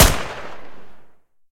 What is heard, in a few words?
uzi fire, Guns